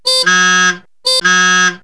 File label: heehaw, cartoon, horns